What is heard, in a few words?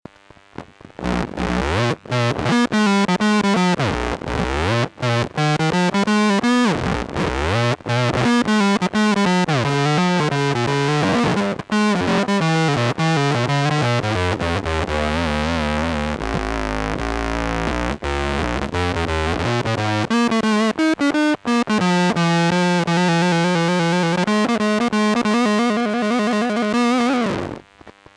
synth box